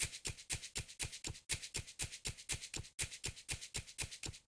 emi sprinting

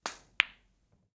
billiards

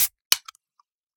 can

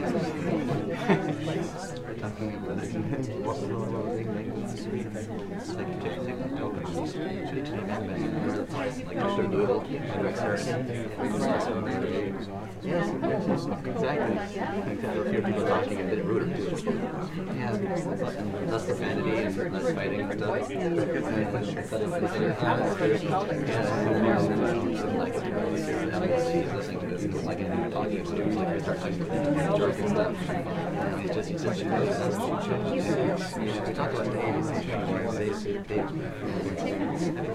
crowd indoors

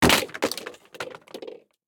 drop stuff

